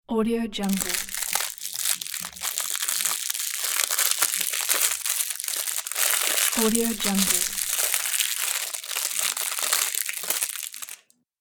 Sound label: rustle